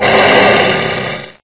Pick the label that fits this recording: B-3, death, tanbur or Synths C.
death